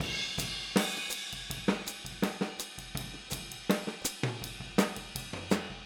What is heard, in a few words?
Boogaloo Fill Hi-Hat, 82 BPM, Drums; Sonic Foundry (Sony Creative Software) - Drums from the Big Room The Mixes